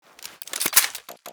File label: Pistol Alien Blaster Reload Bolt Open